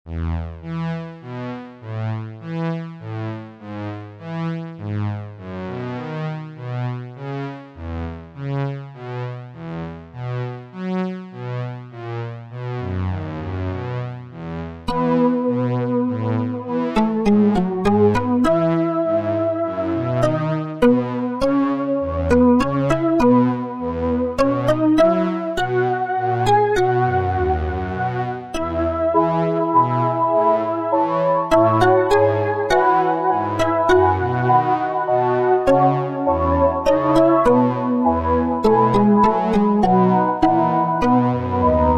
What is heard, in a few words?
instrumental